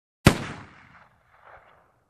Pistol shot from distance

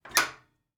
Small Glass door open

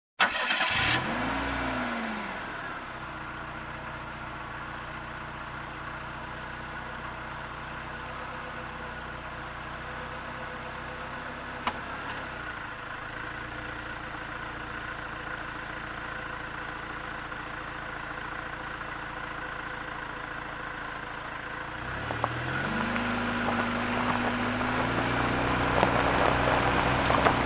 Car driving on dirt road

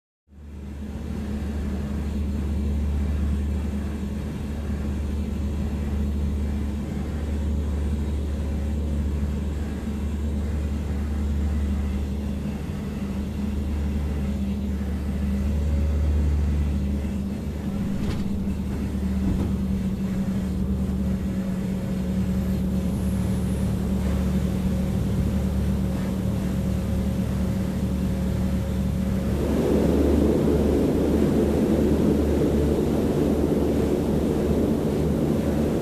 Paratrooper Aircraft Open

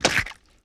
Flesh Limb Rip

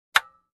Aircraft Metal Part Knock

